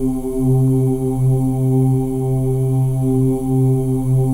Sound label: M+F OO C3; Spectrasonics; Symphony of Voices; M+F OOS